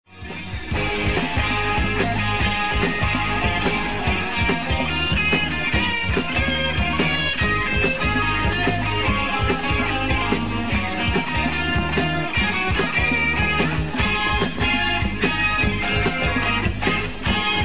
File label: Gtr Solo